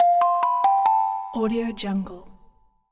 notification alert

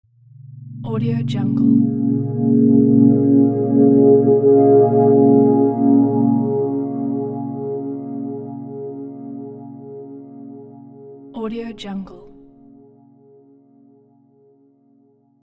ambient logo opening riser; alexm76

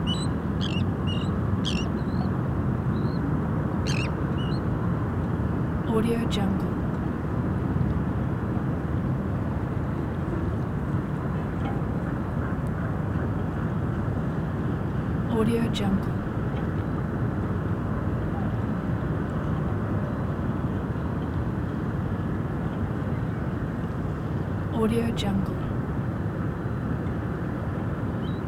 harbor background ambience

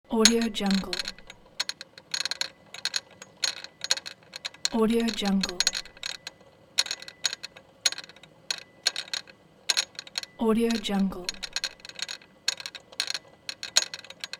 metallic noise

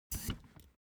object chair office hydraulics down stepwise; smartsoundfx

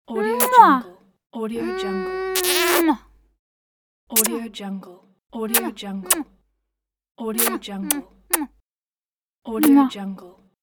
female kiss

